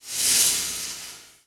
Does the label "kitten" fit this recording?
No